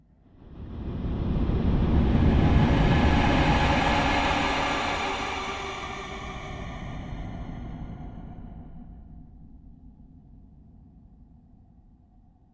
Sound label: Horror Whoosh